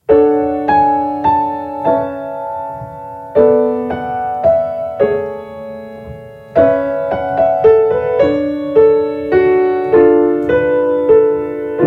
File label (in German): Soul Ballade